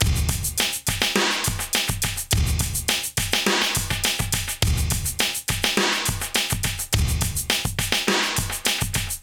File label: Process Beat, Hip-Hop Beats